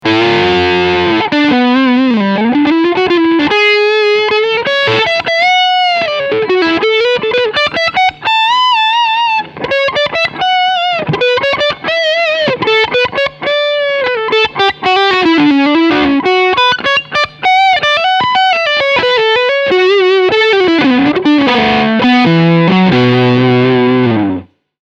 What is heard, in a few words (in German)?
Leadsound Steg PU